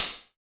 hat f sharp key, pedal hi-hat single hit tuned to the F# note. Loudest frequency: 9252Hz; drum single hits one shots; hat samples